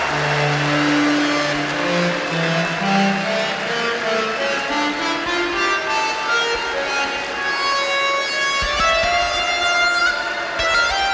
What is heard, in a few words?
Keyboard Solo